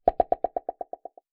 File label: Pop